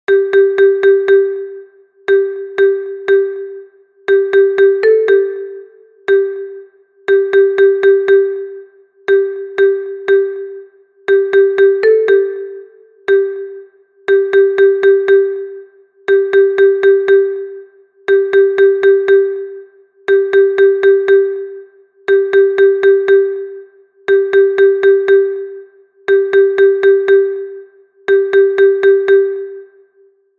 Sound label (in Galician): Xilofono Contralto